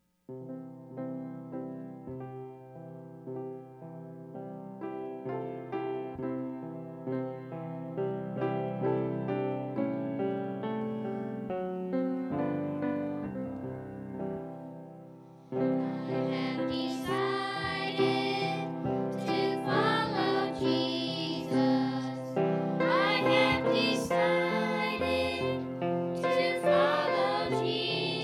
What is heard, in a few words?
Kids Choir